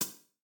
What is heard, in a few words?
breakbeat